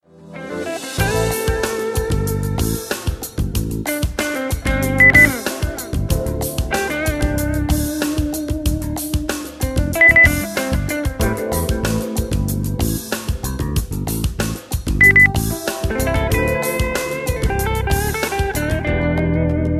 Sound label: rock unplugged beep